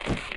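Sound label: shuffle, footsteps hard surface jump up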